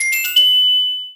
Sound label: Unlocked; raving rabbids travel in time wii gamerip 2010